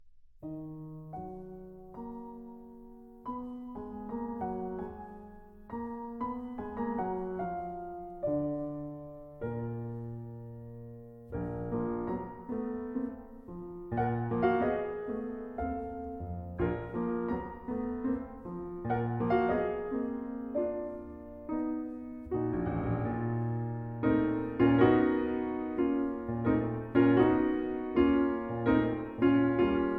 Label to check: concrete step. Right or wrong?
Wrong